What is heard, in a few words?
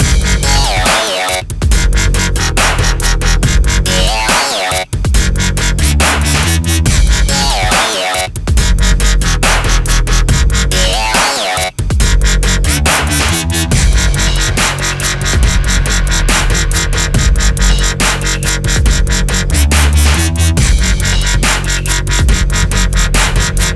Electronic Dubstep